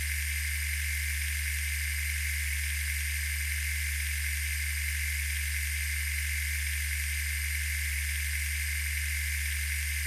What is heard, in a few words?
machine; Monster girl quest